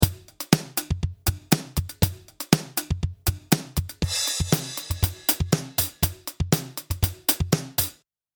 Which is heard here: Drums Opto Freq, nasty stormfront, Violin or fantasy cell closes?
Drums Opto Freq